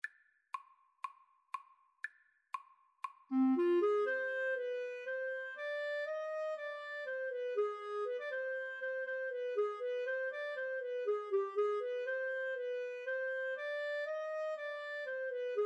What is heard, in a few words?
Odessa Bulgarish, Clarinet Duet